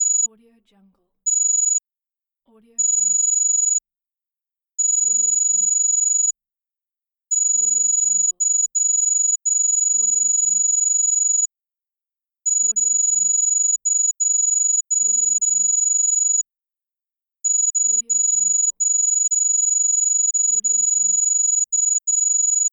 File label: computer digital text typing